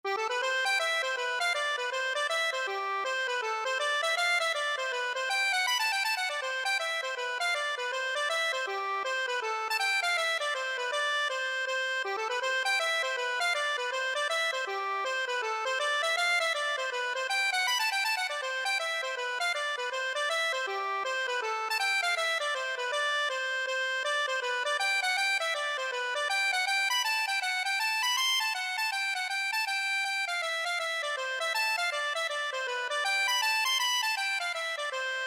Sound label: hornpipe, accordion